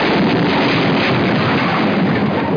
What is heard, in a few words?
BIG CRASH; Driver